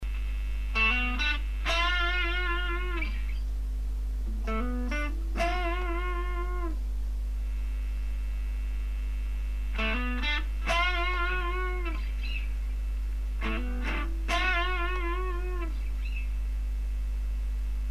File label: Tone